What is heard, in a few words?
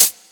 hihat; osu